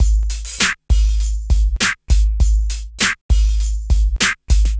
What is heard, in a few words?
Smooth, R&B Drums